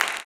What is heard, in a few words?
CLAP, blues & soul; ILIO; Vocal Planet